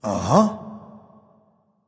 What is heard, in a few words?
"ah", discover; smite; voicepack; ullr